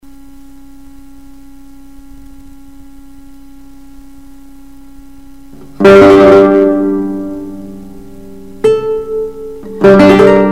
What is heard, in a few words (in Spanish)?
laud; La galatea, II